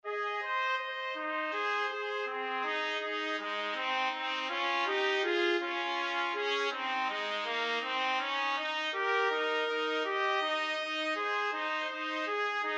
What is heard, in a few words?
trumpet duet